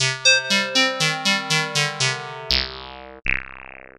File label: Square Chamber; Retro Noise 3 Instruments